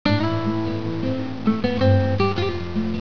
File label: Guitar